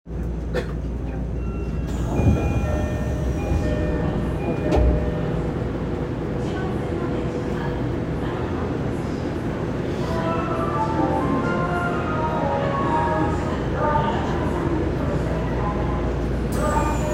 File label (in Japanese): 南北線西ケ原北, train sounds